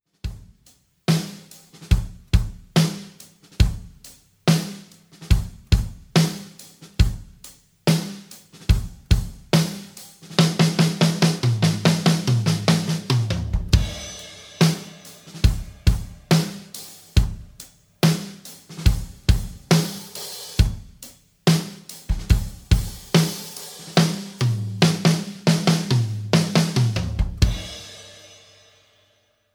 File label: big beat honey slow tempo, drums